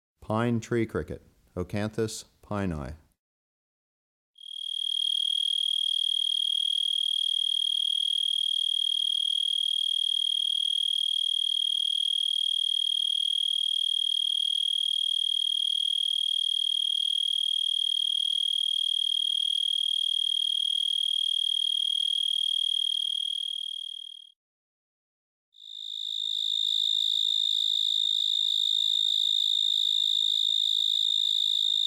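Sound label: Pine Tree Cricket, Oecanthus pini; Crickets and Katydids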